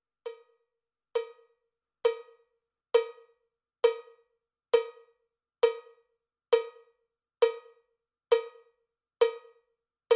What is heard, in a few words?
cowbell click track bpm 79; cowbell click track bpm 67